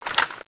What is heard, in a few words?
draw, weapons; furien; cstrike; balrig3